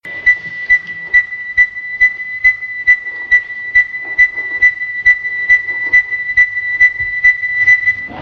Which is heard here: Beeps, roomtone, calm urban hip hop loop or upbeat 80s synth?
Beeps